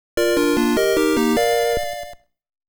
Level Up; Zelda II: The Adventure of Link; FDS, NES

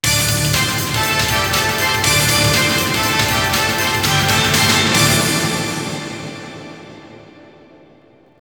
Str G#

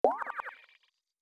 Swim

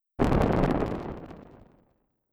Flame; Legend of Keepers (gamerip) (2019)

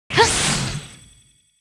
Summoner F Cast

